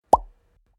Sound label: Pop